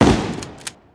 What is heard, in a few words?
shotgun blast; terraria